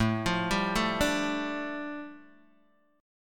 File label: A 7th Suspended 4th Sharp 5th, A, down, Guitar, Open D